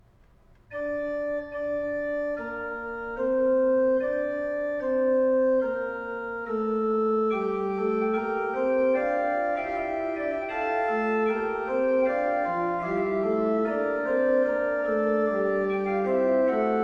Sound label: Chorale Prelude, Organ; ''Jesus Christus unser Heiland, der den Tod''; Johann Pachelbel; Complete Organ Works